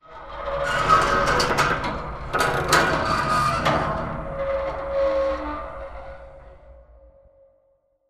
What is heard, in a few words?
metal stress med; Titanfall 2